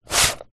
industrial large box of screws and nails slide movement